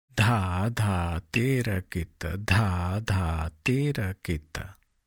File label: Dha dha tirakita Dha dha tirakita, tabla